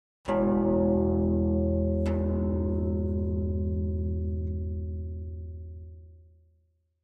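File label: Harp Deep Single Tone; strings harp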